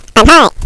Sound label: jump; quake2; bong